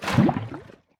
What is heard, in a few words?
fluid unsoak viscous; TFC Quick Pockets